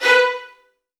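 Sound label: VN FX B2 left; ILIO; Kirk Hunter Virtuoso Strings VOL-1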